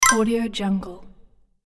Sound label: pick up tokens game sound